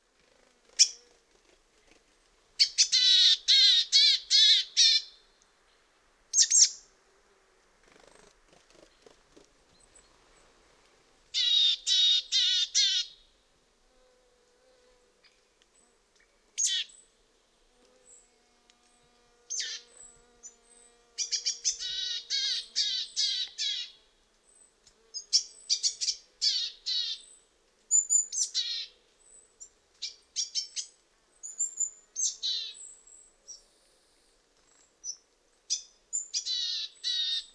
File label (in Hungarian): kormosfeju cinege hangja